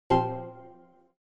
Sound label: OS Sound Notification